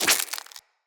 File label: Root Spider Foley Leg Swipe; Animals; Spiders